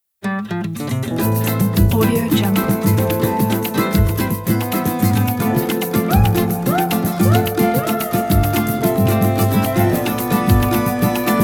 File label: sambinha brazil original; flaviocortizo